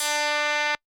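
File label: kawai k1 electric GRAND; wavetables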